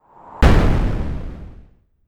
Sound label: Dungeon Spawn; Dungeon Rushers; ps4